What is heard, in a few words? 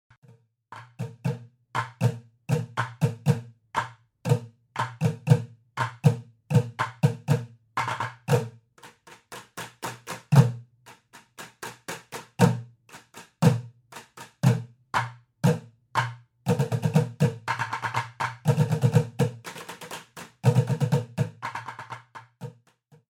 bucket jam